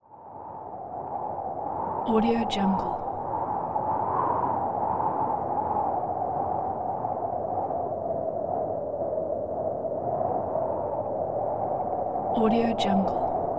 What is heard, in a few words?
wind